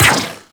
Drone Laser Fire; Artificer Drone; Warframe; Corpus; Super Moa Drone

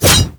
Ghoul Devourer Melee Swipe; Warframe; Grineer Ghouls

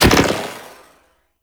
Ball Shoulder Check Burst, Impacts; Warframe; Tenno Ball; Tenno Scoop

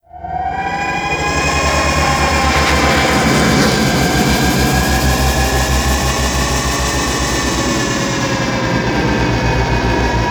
Level Docking; Vehicles; Warframe; Liset; Nora Liset

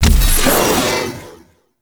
Backstab Self Impact; Warframe; PaxDuviricus

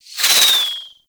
Bow Arrow Rain Whizz By; Ultimate Abilities; Madurai; Warframe; Focus

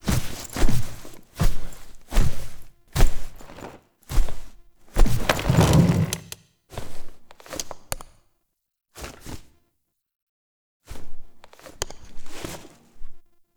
Sit Down And Eat; Warframe; Duviri; Cinematics

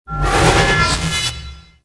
Charge Ability Charge Up; Enemies; Abilities; Warframe; Duviri; Dax Knight

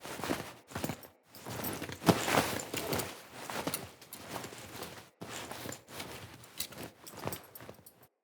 Hallway Transition Foley; Cinematics; Bromius; Ode Hallway Transition; Envoy

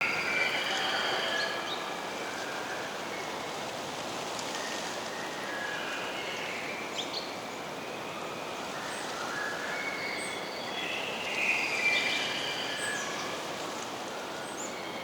Wetland Forest Day Amb Loop; Midrath Island; Ambience

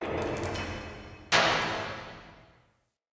Distant Metal Clang Ring; Ambience; Under City

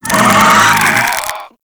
Desert Skate Vocal Death; Warframe

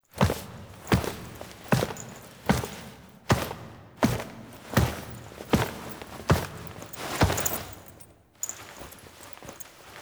Foley; Lotus Reveal; Drifter

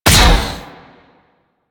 Tetra Shot, Weapons; Warframe; Corpus

